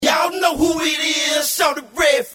turn it up acapella

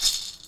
Shaker

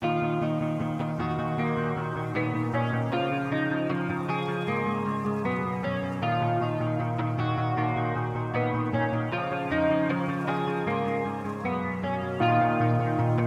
155bpm d# minor

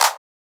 clap 80s clap

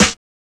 Snares

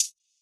Hi Hat

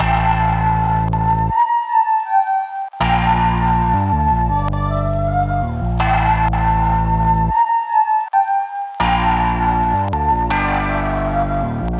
Melody Loop - 160 BPM A Min; Cymatics - Astro Houston

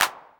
clap one shot verb; Claps

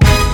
Hit

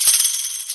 Tamborine; Percs

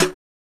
Percs

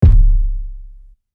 808s

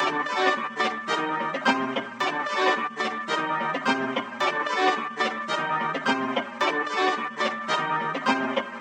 Sample 109bpm

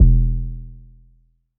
808 E; 808s

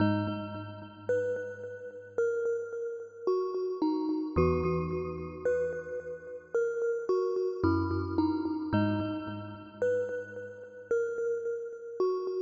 110 bpm

